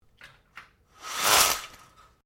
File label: Blinds Venetian Lower Quickly, Household blinds; Living Room (Sound Effects:Household)